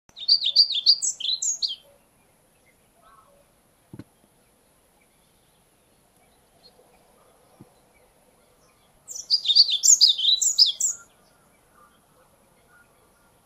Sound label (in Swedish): svartvitflug